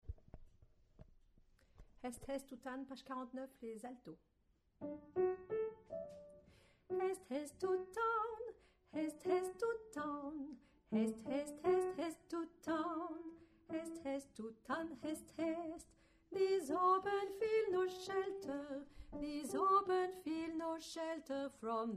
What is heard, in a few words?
haste haste Alto